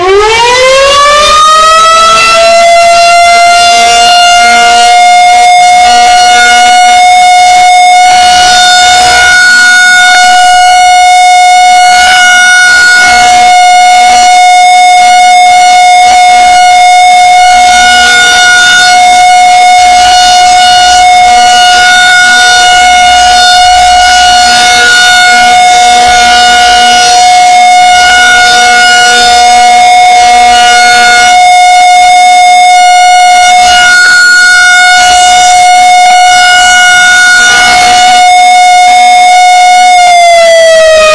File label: test tone